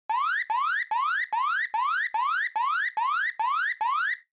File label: Warning Siren High Long